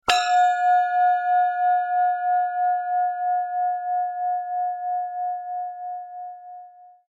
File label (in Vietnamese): Am thanh ding keo dai